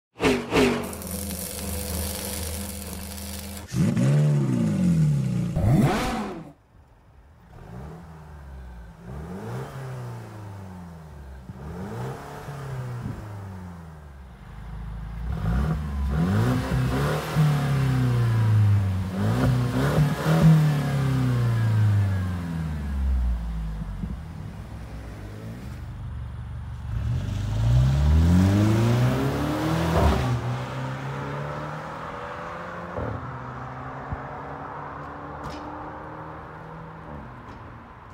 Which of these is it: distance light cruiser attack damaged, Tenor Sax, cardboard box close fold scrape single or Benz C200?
Benz C200